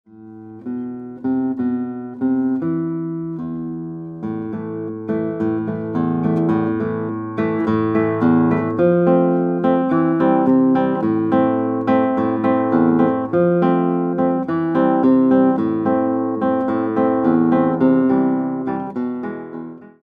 Guitar

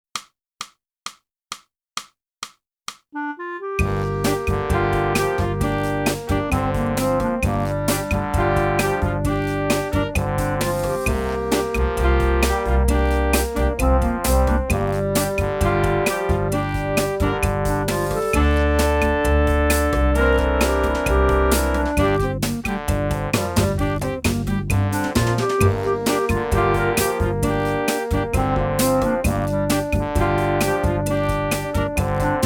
flute; (Morgan) - Boosey Woodwind Method: Flute (Book 2) (Book & CD)

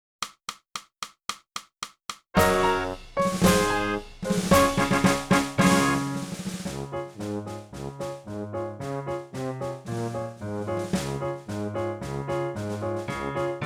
sax colonel bogey

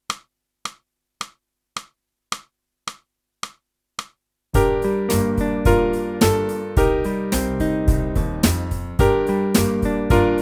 sax

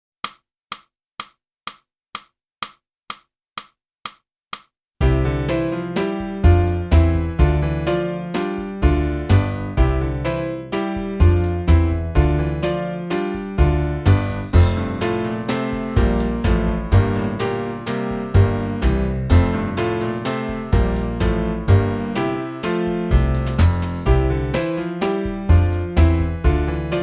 flute

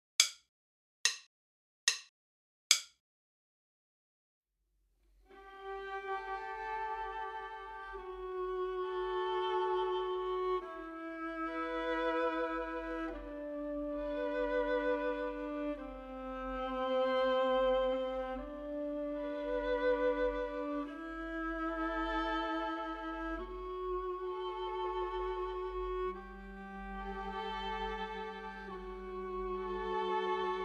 minus Cello

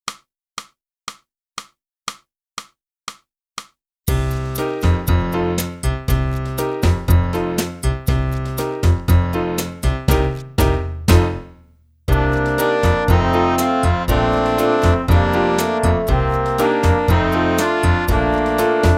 flute; boosey

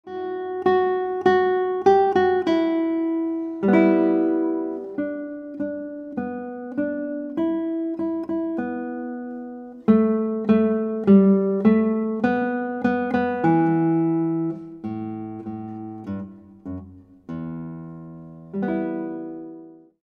Guitar

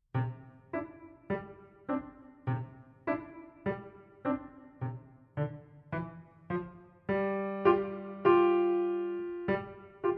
piano

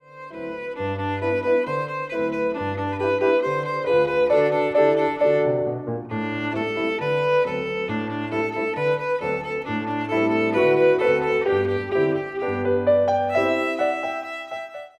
VIOLIN

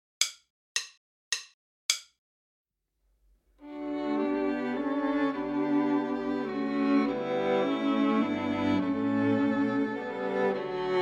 minus Cello

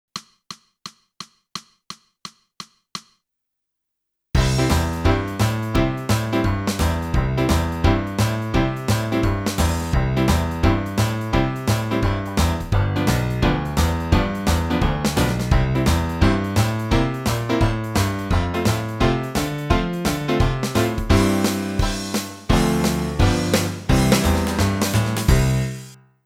clarinet; boosey method

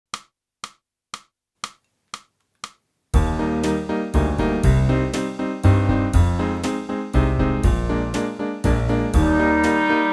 clarinet; boosey method

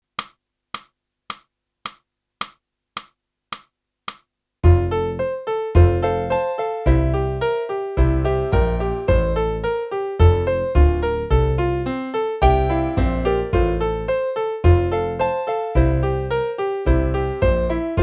sax

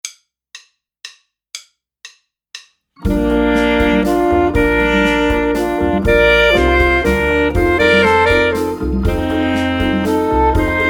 Duo For Two Equal Saxophones Full Sax

